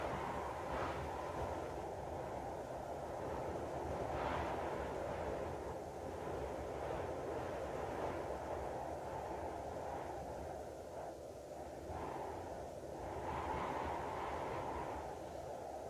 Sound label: Ceiling Sound; The Twins; Android iOS gamerip 2020